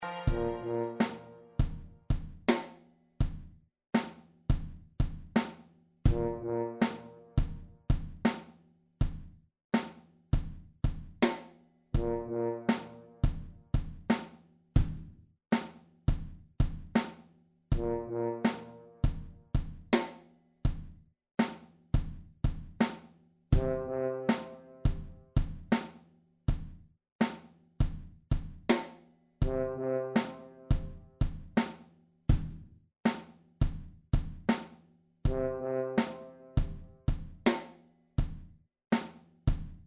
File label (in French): rue du bruit improvisation